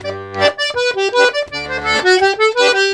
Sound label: Accordions; USB Soundscan vol.40; AKAI